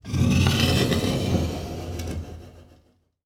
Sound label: Door Open Shower Sliding; household